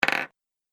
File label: Game Piece Fall, thud; Object Sound Effects